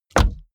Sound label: car bmw e46 exterior door rear close; Cars